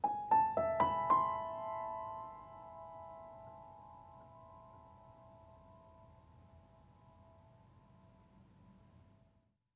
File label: Music Level Argument Stinger; Florence